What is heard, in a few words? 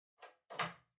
TV Hardware Far Earphones Plug In